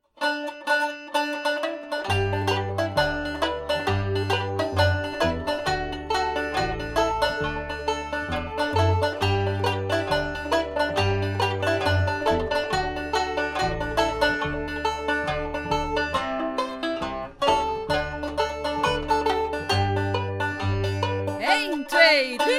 linedance dance met zang

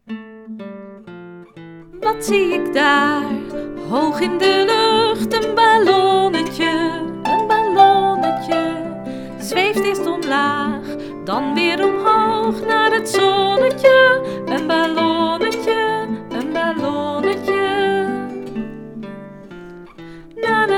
met gitaar en harp

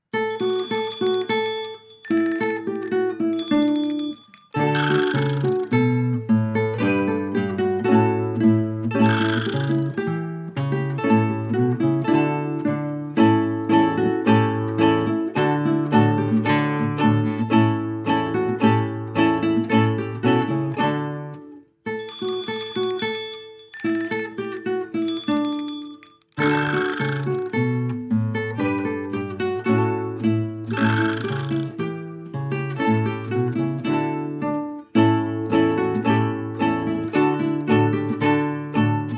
brrr zonder zang

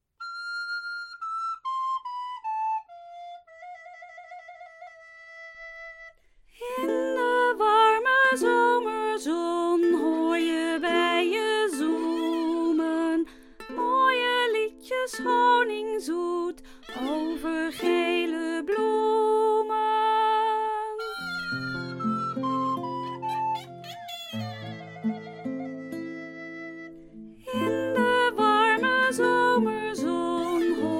met zang

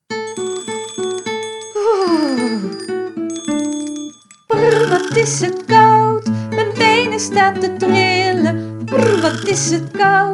brrr met zang

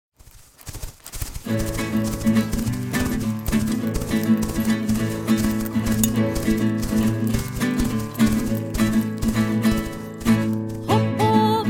met zang

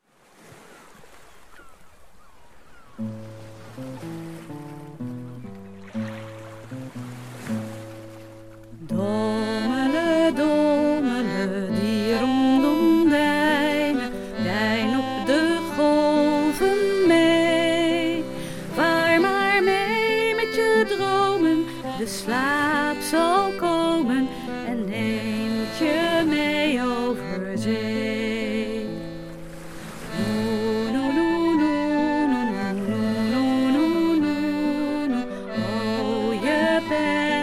met zee met zang

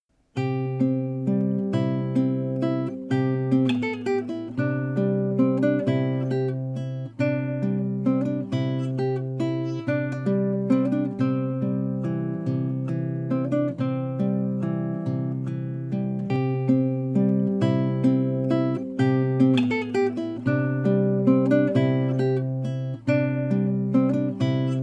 schaapjes tellen zonder zang

